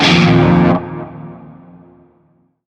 Shawzin Pent Min Chord

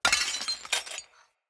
Vase Porcelain Small Break; Vases

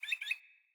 Bird Double Chirp; Josalfar Bird Guide

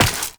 Step Ice, Footsteps, Walk; Warframe; Tenno

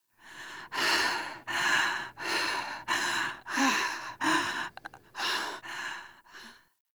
Landing Breaths; Cinematics; Cetus Landing

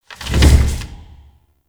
Grimoire Alt Cooldown Complete; Warframe; Tenno

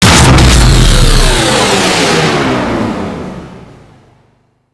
Jackal Stomp Impact, Machines

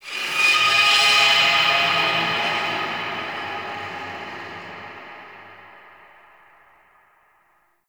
Golden Maw Ambient Texture Two; Warframe; The War Within

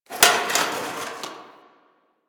Box Of Trash Rustle; Warframe; Ambience; Nineteen Ninety Nine

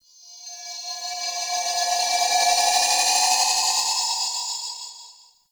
Ship High Washed Bells; Warframe; Corpus Ship Remaster

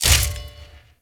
Garage Door Close; Gameplay; Warframe; Venus Open World

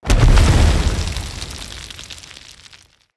Claw Slam Attack Impact; Attacks; Animals; Bromius

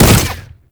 Arcade Automata Impact; Gameplay; Warframe; Nineteeen Ninety Nine; Deep Archimedia

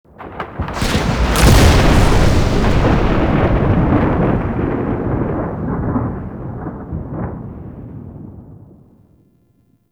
Sky Thunder Clap; Duviri Murmur

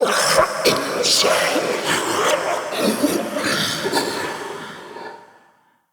Male Vocal Stand Long, Enemy; Mockery; Mockeries

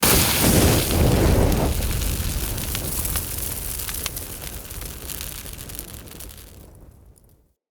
Fire Hazard Object Ignite Sizzle B; Undercity

